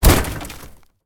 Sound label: car door close